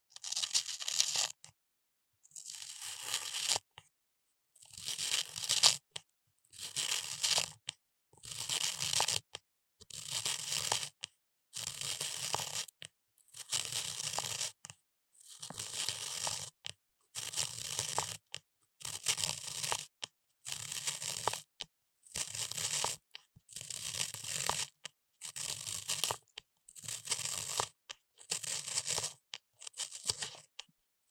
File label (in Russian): zatochka karandashej